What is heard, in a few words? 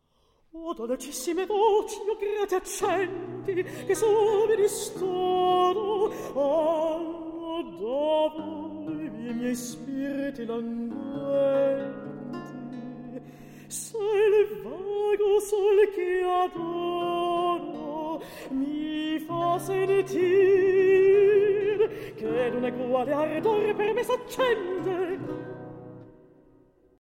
Recitativo; Teseo